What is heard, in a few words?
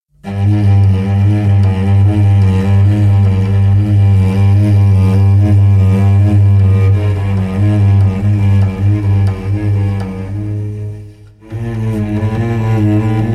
cello